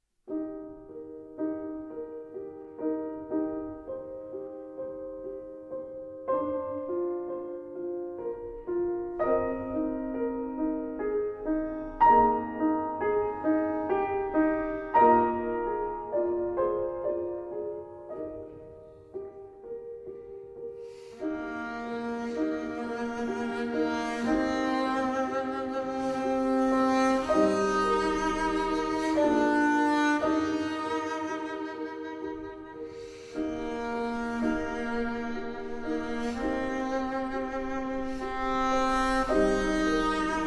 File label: Arr. For Double Bass & Piano; Du Bist Die Ruh, D. 776, Op. 59, No. 3